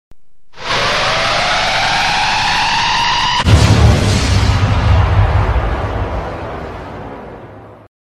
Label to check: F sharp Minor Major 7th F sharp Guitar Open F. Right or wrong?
Wrong